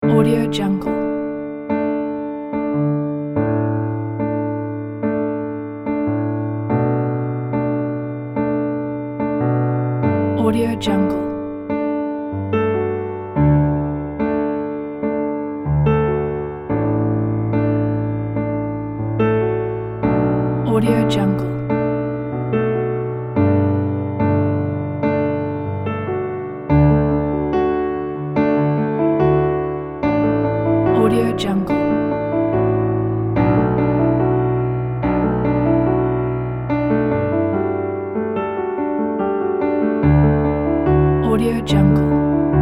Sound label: storytelling piano; yellowbreadmusic